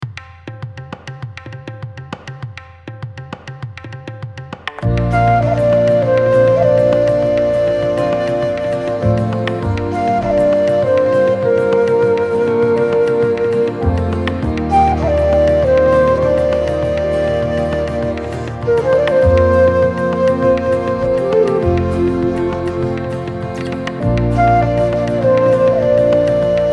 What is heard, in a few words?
Indian Folk Tune